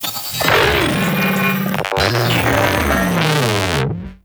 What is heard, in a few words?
Taunt; Warframe; Corpus; Jackal Redux